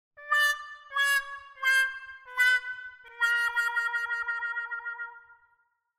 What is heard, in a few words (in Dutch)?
Tin mute wah wah trompet